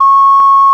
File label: OCAR A; Roland - LA Composer vol. 1